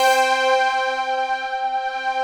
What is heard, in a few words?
chainsaw mass, keyboard pads